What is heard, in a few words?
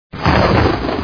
storm error; citystorm